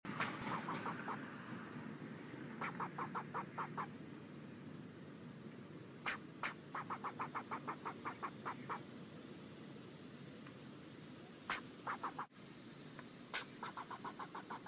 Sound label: squirrel sounds